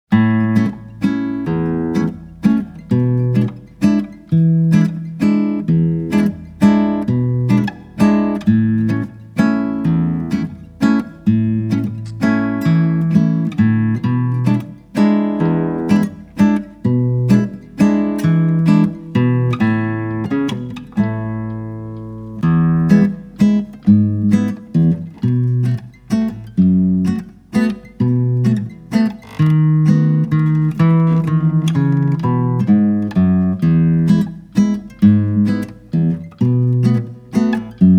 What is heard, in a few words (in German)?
Walzer Landler Walzer Playback ohne Melodie